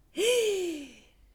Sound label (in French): soupir; Index des soupirs; Hadjithomas Joreige